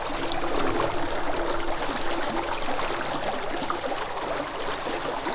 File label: water flow metal; Quake 4 Alpha; id software; environment